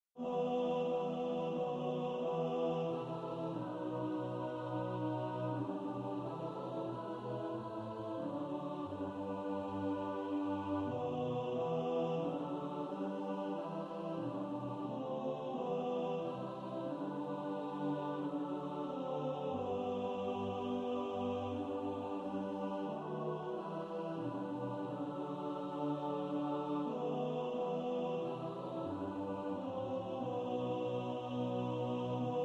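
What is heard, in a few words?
alto, practice music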